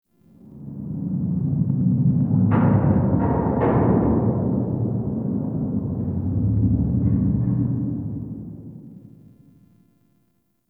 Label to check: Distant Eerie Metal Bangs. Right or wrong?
Right